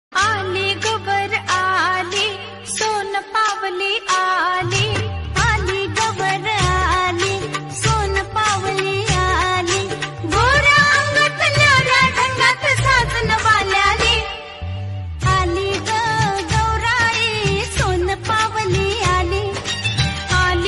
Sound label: song marathi ringtone; rolex surya bgm